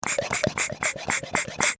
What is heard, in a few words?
Household Pesticide Pump Fast Dry; Yard Tools (Sound Effects:Tools)